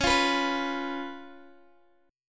Db 7th Db Guitar Open G

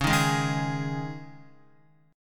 Db Suspended 2nd Db Guitar, Dbsus2 chord; strummed; JGuitar

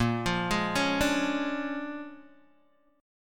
A sharp 7th Sharp 9th, Guitar, Open G

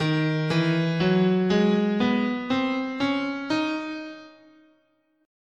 Eb Symmetrical, scale

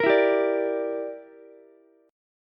Bb 6th Flat 5th, Ukulele; Bb6b5 strummed

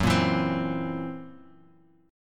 Fsharp 7th Flat 5th down Bass Standard; strummed; JGuitar

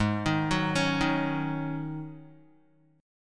Ab 7th Suspended 4th Ab Guitar 4F,4A,5C,5G,5C,6E; Ab7sus4; 3,6,6,6,3,x; arpeggiated; chordshape; JGuitar

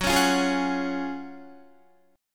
Gb Major 7th Suspended 2nd, Guitar; GbM7sus2 strummed; JGuitar

